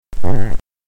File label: Minke Whale Rumble